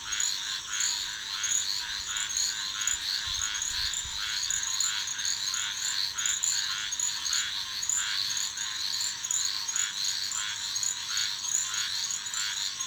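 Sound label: Swamp Frog Ambience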